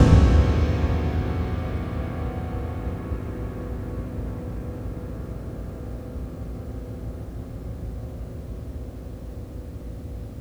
Ped Push No Att, Piano Pedal Push; Piano Miscellaneous

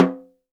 Snare Drum; Percussion Samples

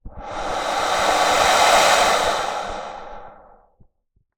Wind, Melodica

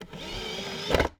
Insert, DVD Drive Internal; DVD Drives

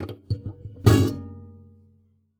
Classical Guitar Extended Technique

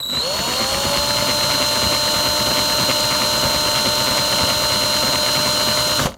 Drill

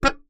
Melodica Short Note

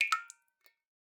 Drips

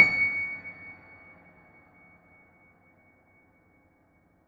Note Ped, Piano; Piano Miscellaneous

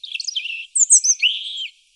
Birdsong Bit, Oxford Island Lough Neagh

